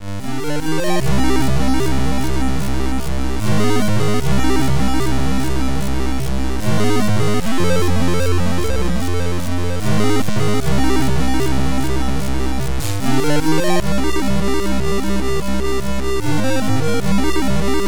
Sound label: Orchestral